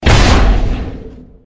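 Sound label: attack rush; molong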